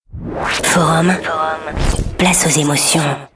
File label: jingle